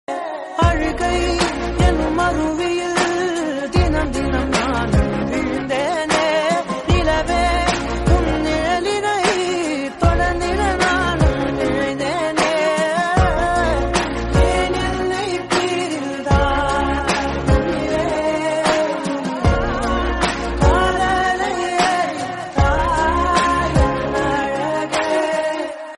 lyrical sad song; adithya varma

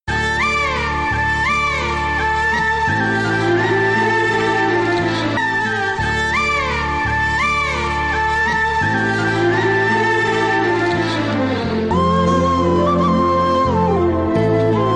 bgm malayalam; kadha parayumbol; love failure

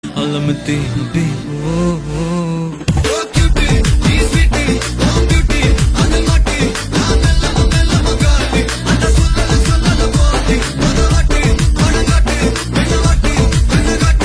bg ringtone

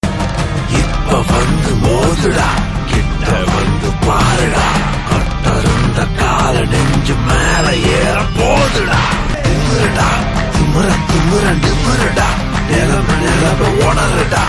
teaser bgm ringtone; tamil; soorarai pottru

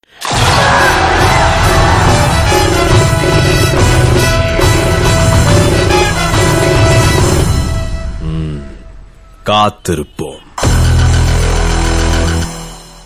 tamil bgm ringtone; suriya; ngk

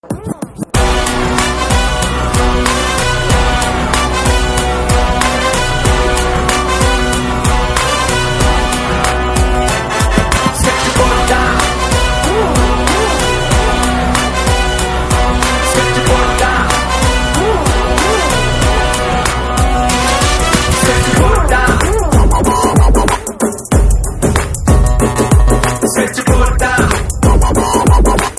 theme ringtone; tamil; sketch

